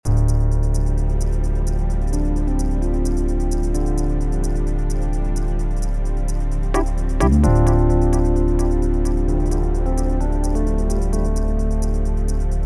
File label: jazz; urban